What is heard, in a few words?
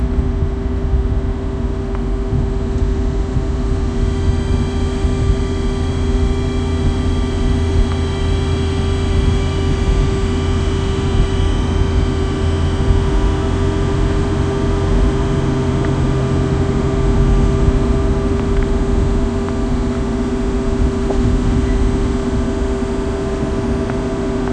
a radio plays music while, car